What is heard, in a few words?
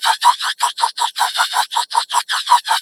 Boomerang Whoosing Loop